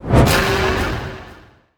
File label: Necromancer Skill Impact